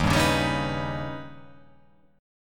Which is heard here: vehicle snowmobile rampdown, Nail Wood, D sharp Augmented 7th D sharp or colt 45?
D sharp Augmented 7th D sharp